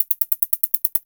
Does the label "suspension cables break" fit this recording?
No